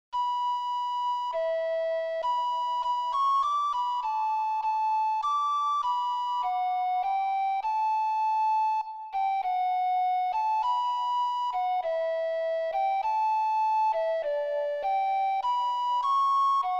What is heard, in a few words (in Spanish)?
Flautas a Apolo